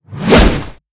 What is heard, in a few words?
Angry Yeti Attack; Seer; GamigoNA